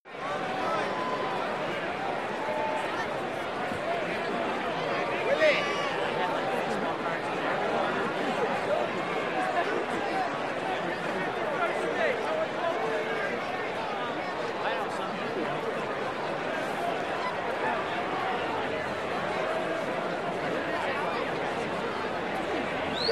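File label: Arena Crowd Ohs Cheer; sports arena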